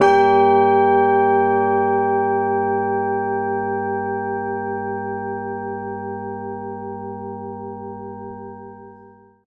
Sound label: G bell; berlioz